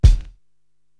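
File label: BD Sleepy, bass drum, drum machine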